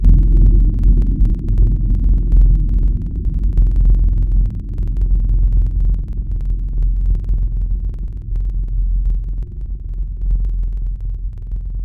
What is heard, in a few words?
spring reverb table; Synthetic's Serum Collection; Chill Trap 2 Tables